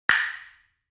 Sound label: Castanets single; musical; gfxsounds